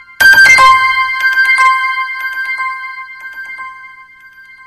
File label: Android Message